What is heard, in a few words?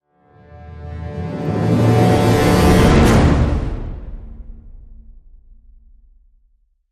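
Hit Orchestra Brasshole D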